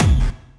bkick

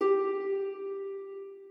harp

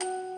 musicbox

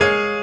piano

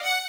violin

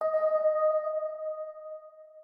organ

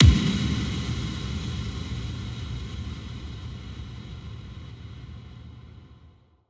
Dr impact

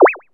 BOOKMARK ON, SYS BUTTON; maimai

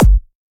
Dr Kick

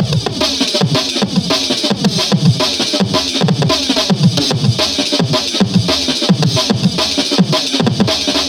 Dr Loop break

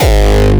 gabba

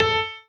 piano melo

